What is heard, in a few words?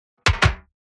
metal texture